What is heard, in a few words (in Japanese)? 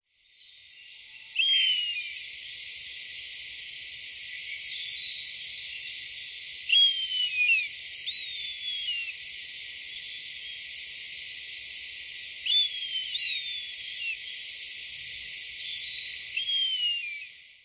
nosuri, singing